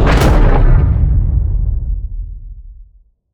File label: step; skibidi toilet emperor